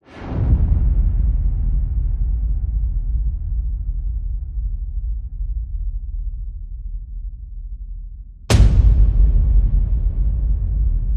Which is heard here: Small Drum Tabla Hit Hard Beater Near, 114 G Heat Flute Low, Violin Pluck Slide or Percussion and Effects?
Percussion and Effects